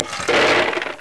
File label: ice dump; Leisure Suit Larry 6 - Shape Up or Slip Out; Floppy DOS; scummvm